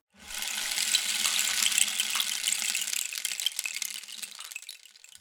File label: Rain Stick, Percussion Samples